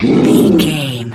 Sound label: troll monster attack slow